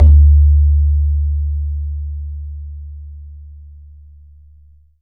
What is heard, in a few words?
BAYAN, Drums Percussion